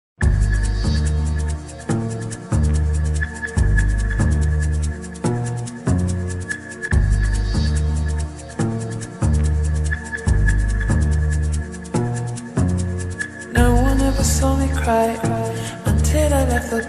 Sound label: slowed reverb